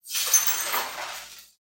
glass debris; SoundsCrate

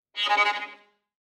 Violin Shivering Tremolo Short; SoundsCrate

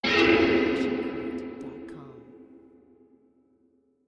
fuzz hit

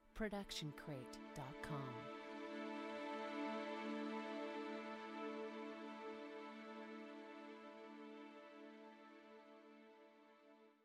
feel good strings cresc decresc

